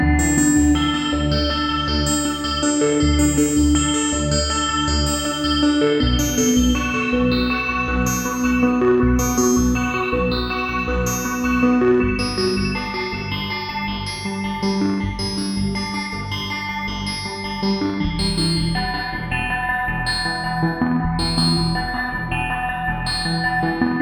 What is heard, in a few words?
music; lightturret